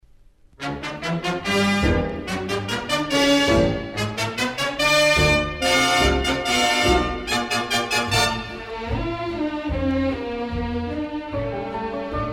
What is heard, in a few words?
Dance Swedish Rhapsody